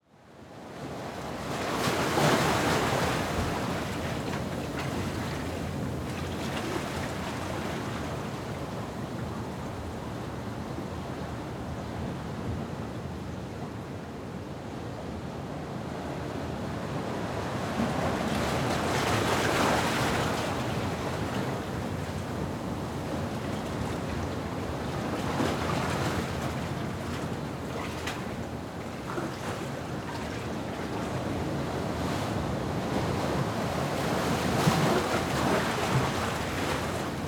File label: WAVES - Beach Waves in Hole, Colombia, Caribbean Coast